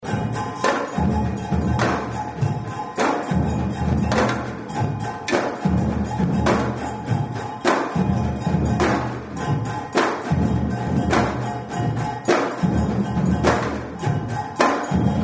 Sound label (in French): funk; parade metisse